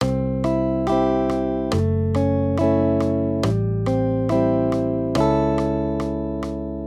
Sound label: maj m3, Major Triads, Root Movement Three Semitones, Guitar, Accompaniment; C-A